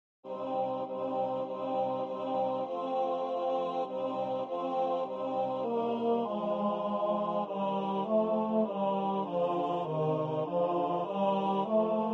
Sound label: tenor; sala ensayo